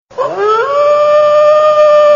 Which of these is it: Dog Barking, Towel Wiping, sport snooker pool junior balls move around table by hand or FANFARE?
Dog Barking